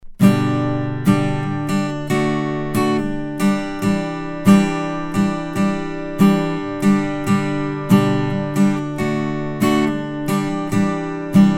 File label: Acoustic Guitar; Lauten Audio Clarion FC-357; FET Condenser